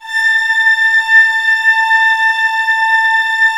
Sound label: orchestra A5 FF; Sound On Sound - Peter Siedleczek's Orchestra